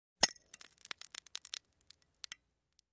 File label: Small Flash Bulb; flash pops